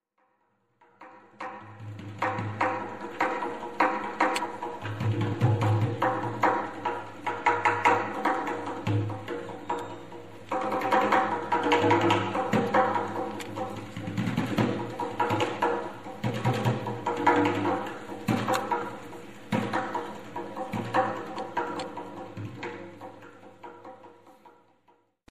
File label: Padou Rhythm; metasound project